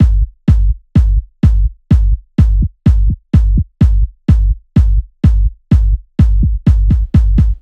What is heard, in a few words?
126BPM Cubic Kit; Vengeance Samples